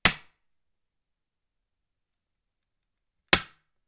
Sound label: Palette, WoodenPalette